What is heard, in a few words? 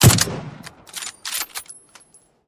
Gun Sound; Gangstar; Gully Loops